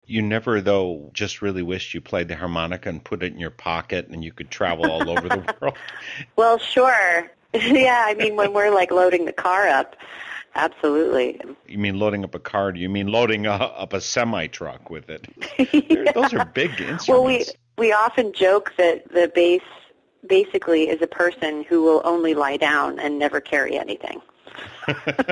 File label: BASS